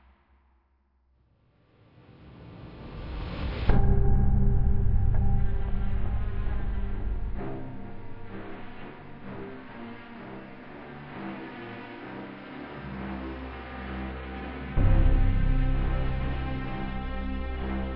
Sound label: Music; Rothjack MFP